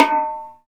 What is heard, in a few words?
Bongos, Latin; Roland L-CD701